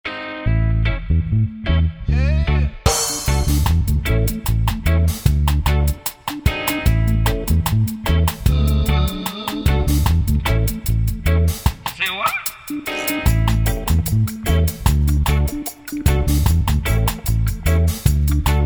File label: Rubber Dub